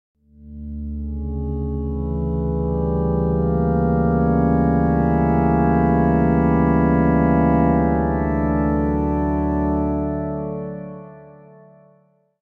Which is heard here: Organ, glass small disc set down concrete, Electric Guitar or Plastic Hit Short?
Organ